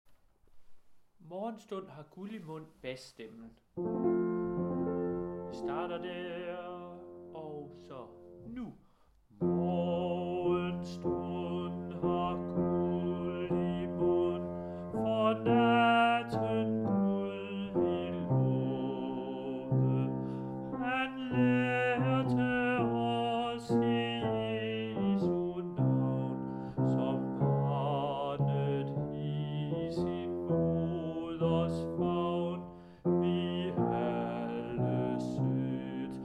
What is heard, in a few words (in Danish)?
Morgenstund har guld i mund, bas